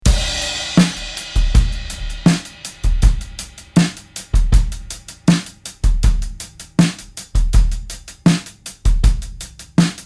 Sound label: drums saturated